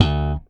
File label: Slap One Shot D, Bass; Bass X